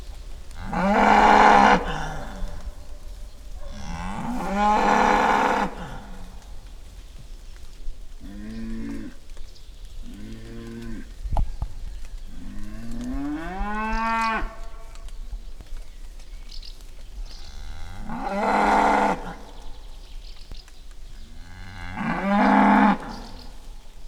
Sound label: Bos taurus domestica